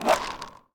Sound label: skateboard roll air; Smite; Sun Wukong